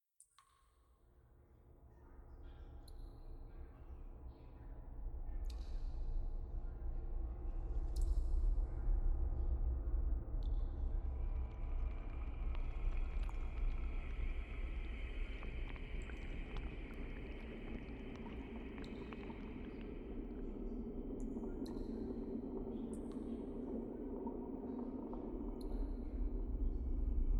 Dark Cave Ambience Cave Soundscape Echo Sounds and Water Drops